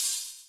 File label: Cymbal, Hip-Hop